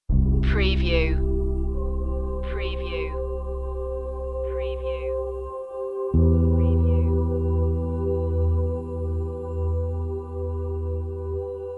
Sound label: flowing emotions no piano